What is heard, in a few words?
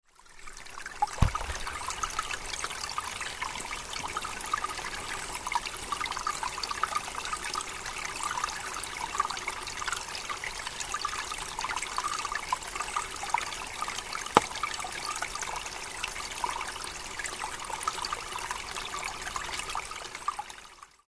river; yambaru